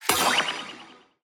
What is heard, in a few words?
Impact FX